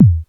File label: Kick